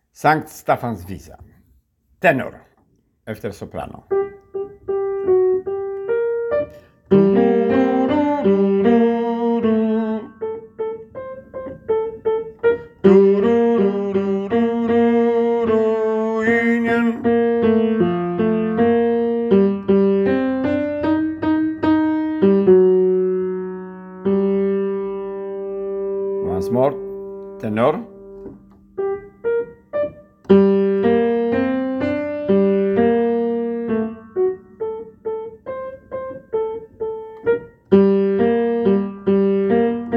tenor

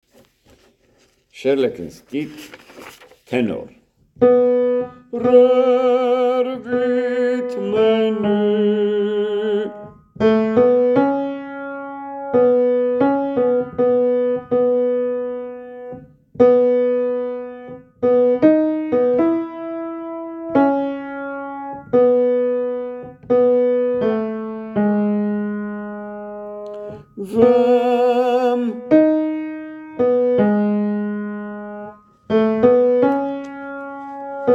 tenor

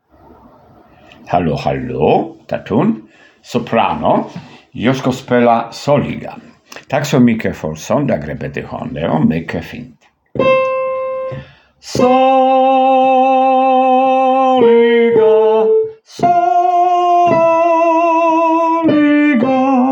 sopran

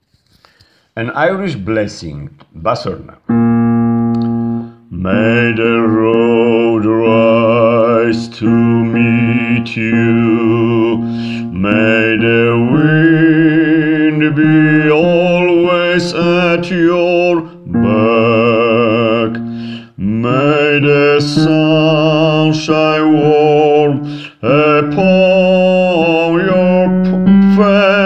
bas

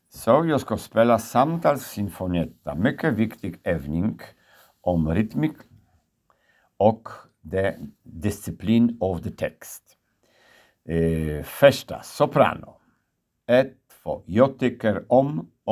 sopran

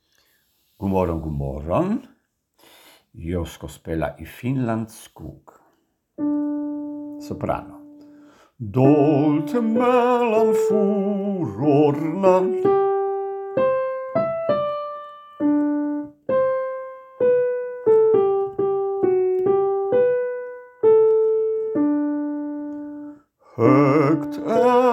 sopran

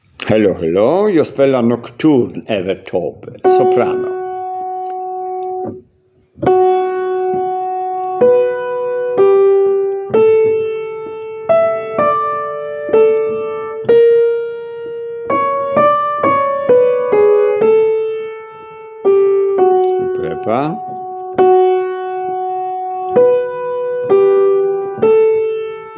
sopran

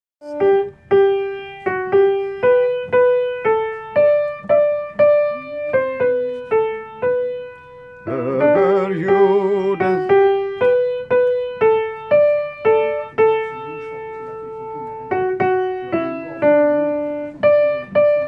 sopran

